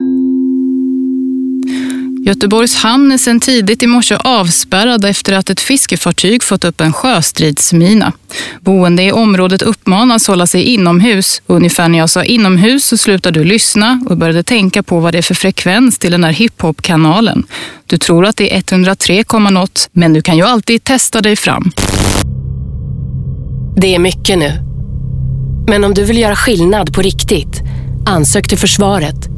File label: hiphop